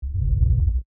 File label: Whoosh Low End, Movement; Whoosh Sound Effects; Whooshes